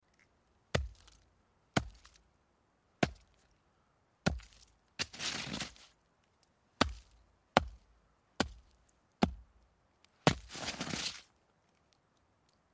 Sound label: Ice Scrape Impacts On